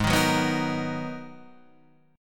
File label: G sharp Minor 9th G sharp; G#m9 strummed; JGuitar